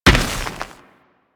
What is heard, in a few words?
Combined Step Dirt, Footsteps; Enemies; Warframe